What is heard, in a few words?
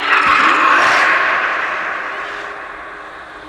pred yell; cstrike